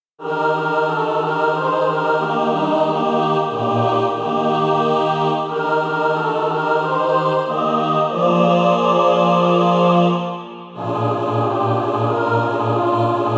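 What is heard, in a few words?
mixed, SATB Practise Music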